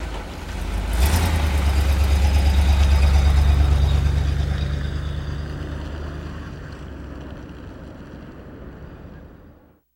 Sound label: English Taxi Idles And Drives Away